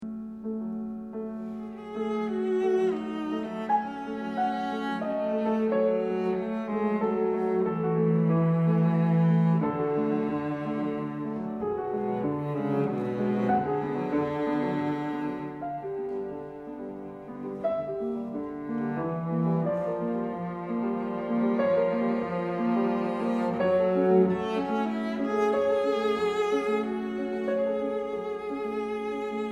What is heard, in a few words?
Sonata for Cello and Piano Con moto; Leoš Janáček; Pohadka